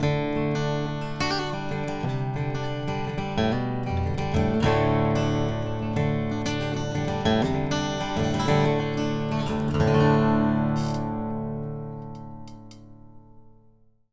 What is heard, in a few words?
Picked Guitar